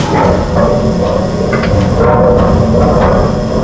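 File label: ambience industrial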